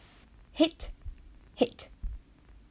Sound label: Hit